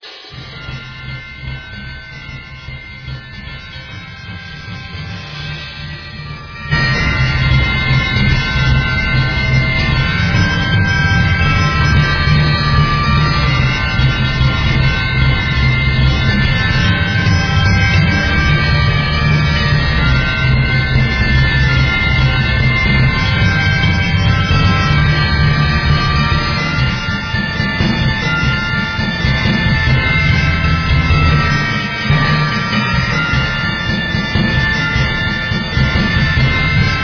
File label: Manic Percussion